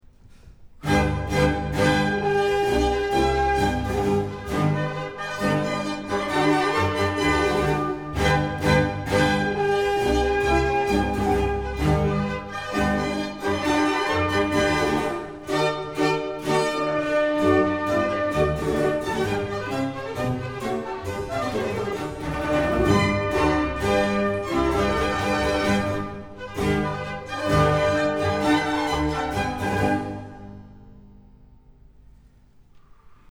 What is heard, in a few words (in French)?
Air en fanfare